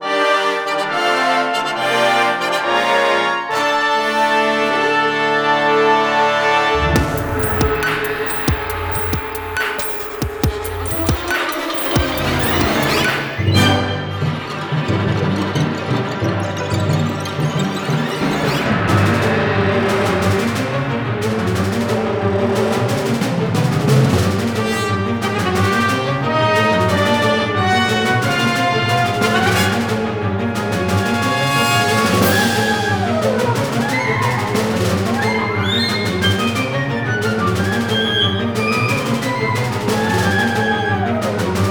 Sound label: Coin Battle (Game Version); Metroid Blast